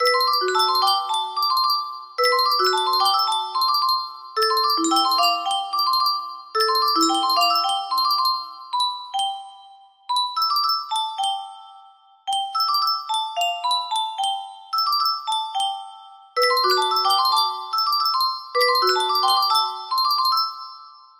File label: jungle funk, music box